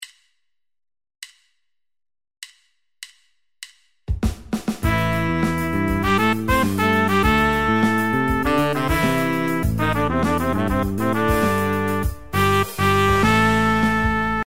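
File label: Pops Horn Section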